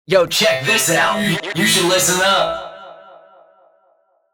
Vocoder; Guitar Rig 6

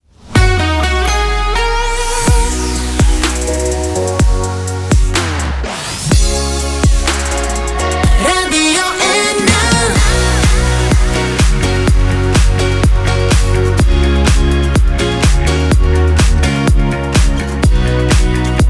radio Slovenia TOH general

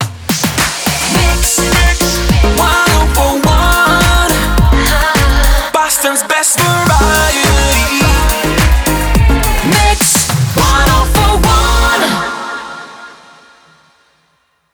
105bpm b mix main ID; WBMX

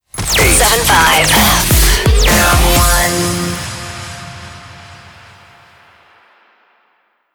Jingle 128bpm Fm D Rapid Fire; 87.5 FM1 Manila

